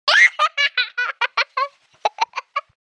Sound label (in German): Kinderlachen klingelton